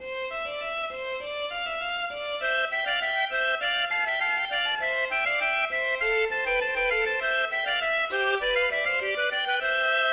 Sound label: fanfare; tink